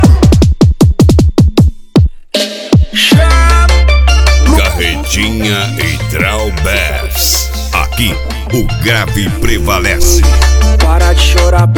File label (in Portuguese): piseiro